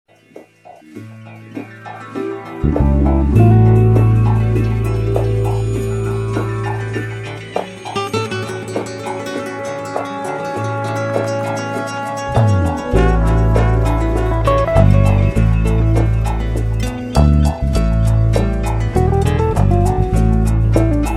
Ambient Chillout; Ringtones

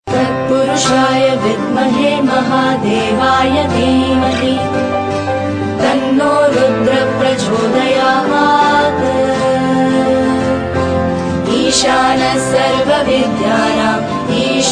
shiva gayatri mantra; Navratri Special

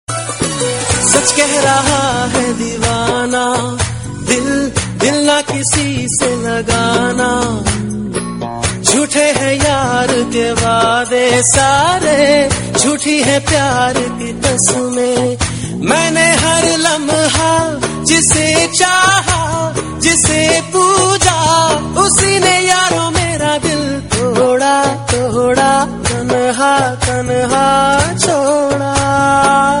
Hindi Sad Tones; Hindi Movie Ringtones

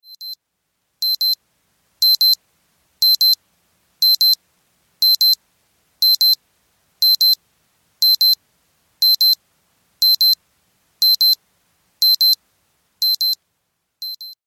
Alarm Wristwatch; Brian Martens; Alarm Tunes; Ringtones

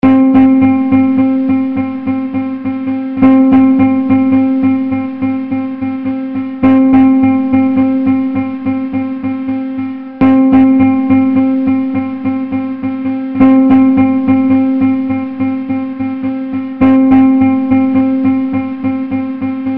Why, Funny instrument Ringtones

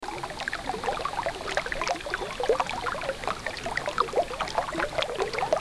river; weather; Sound Effects Ringtones (Water Weather)

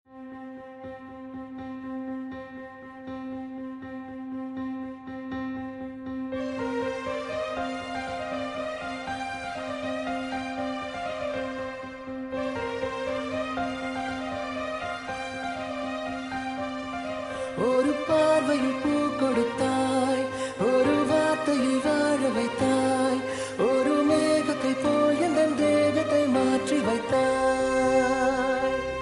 sms bit shiva ringtone; Navratri Special

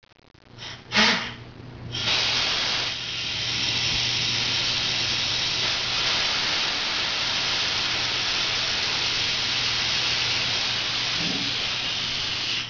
Steam Valve, Water; Ringtones

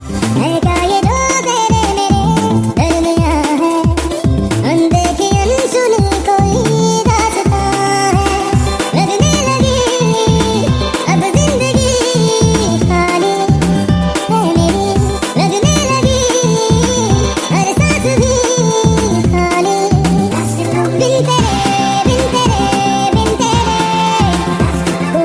Funny High Pitch Hindi Ringtone

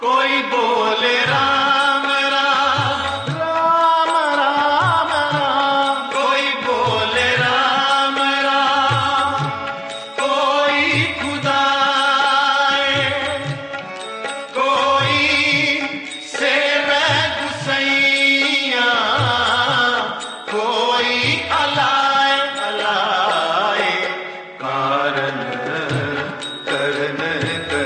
koi bole ram ram Ringtone, Sikh Ringtone; Navratri Special

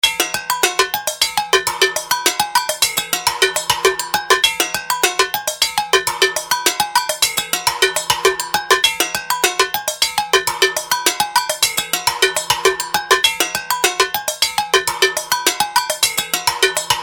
Kitchen Pots Pans; Funny instrument; Ringtones

